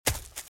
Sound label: Footsteps - Leaves - Run - Right; RadwinIsland